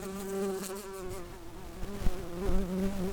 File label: Pascuorum workers, bumblebees